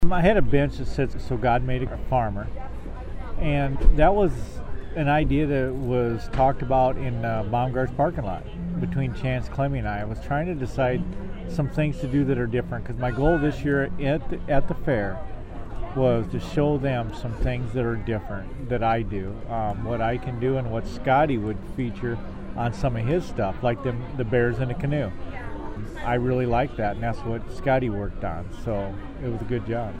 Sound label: chain saw